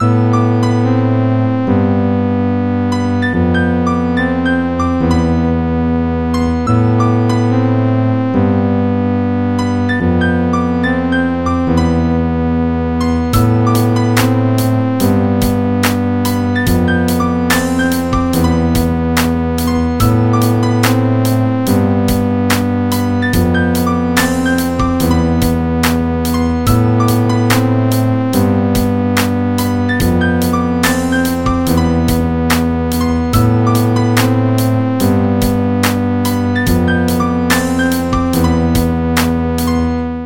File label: instrumental; Disney